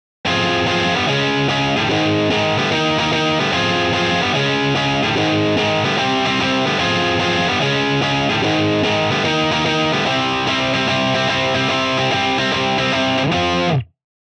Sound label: jtm45